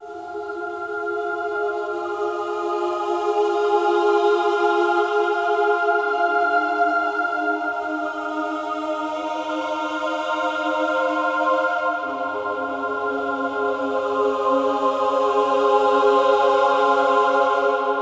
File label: Choir Fm 80Bpm; Construction Kits; STEMS; Ghosthack; SkyRealm; Kit 08 - Into The Light